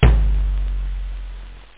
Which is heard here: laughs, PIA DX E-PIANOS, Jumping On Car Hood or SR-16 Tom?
SR-16 Tom